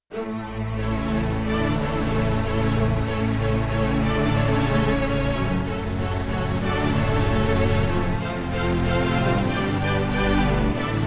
Big Boyz String Mix; Yamaha TX16W

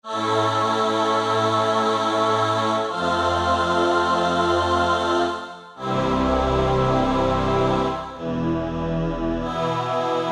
Octave Choir, E-mu Proteus-1; Presets

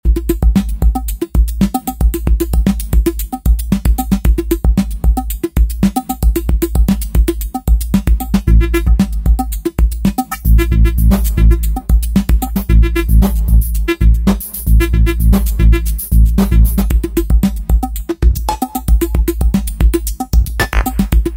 Non Linear Kit, Roland V-Synth; Factory Patches

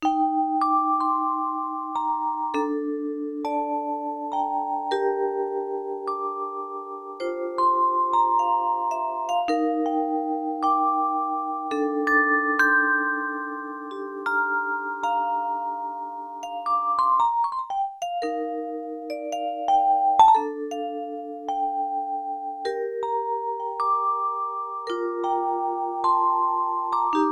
Tremolo, Vibraphone, Yamaha TX16W

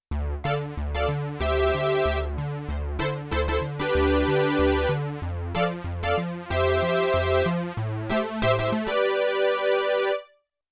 Mixed Filters, Yamaha TX16W, Prophet 5

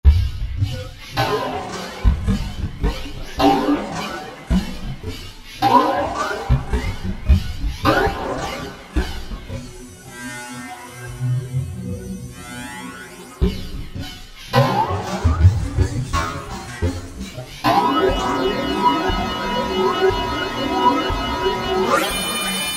Alien Groove, Roland V-Synth; Factory Patches

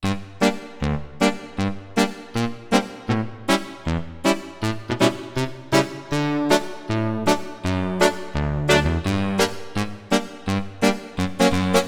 Brass Tacks, Roland VC-1

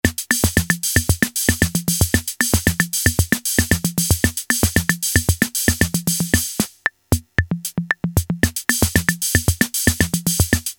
samba, Casio MT-68 rhythm

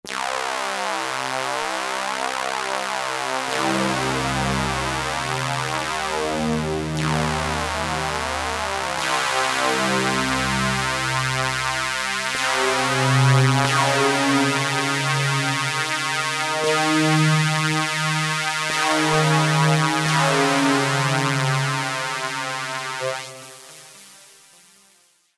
Blade Stepper, Novation XioSynth 25

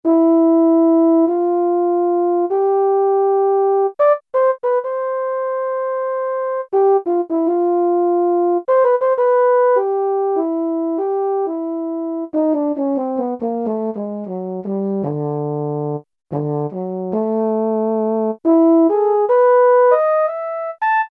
BRS Euphonium; Brass And Woodwinds; Roland L-CDX-03

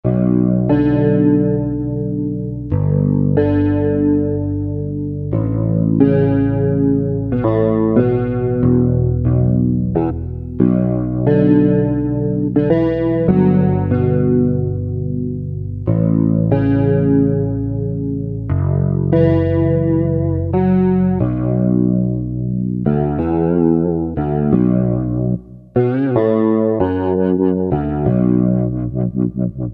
E.Bass, Korg MSC-2S Synth; Synth 1

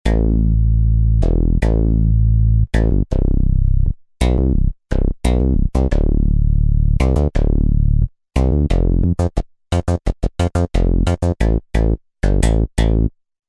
Rubber Bs, Roland JV-880 Factory Patches

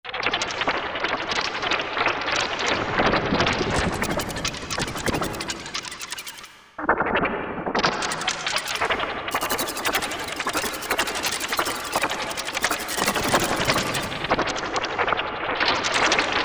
Flying Moth, Sound Effects; Korg MSC-14S Sound Effects

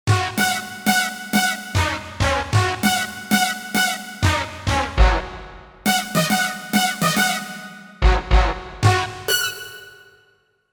Orch Stab, Roland JV-880; Factory Patches

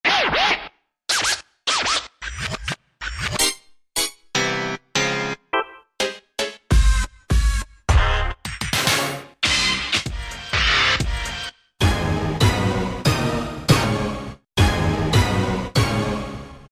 Scratch Menu, Roland SR-JV80-06 Dance

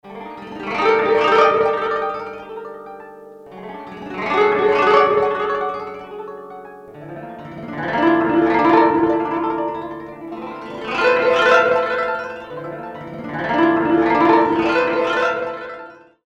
Glisando, Japanese Inst; Korg DSS-1

